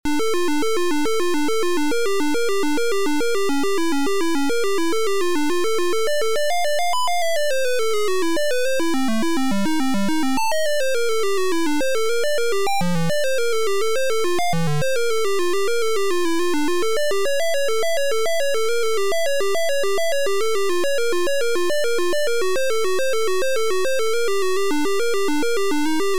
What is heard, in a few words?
MELODY